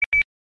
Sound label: beep